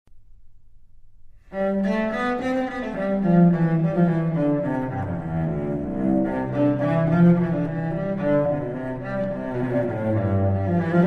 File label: Cello Allemande C Major; Cello Suite No3; BWV1009